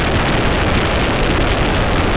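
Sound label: fire ball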